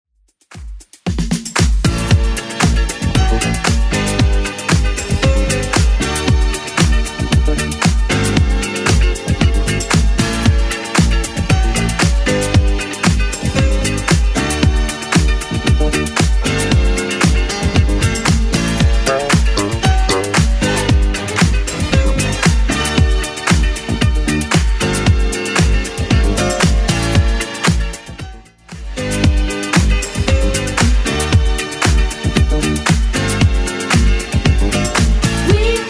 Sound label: 70s Club Edit 115bpm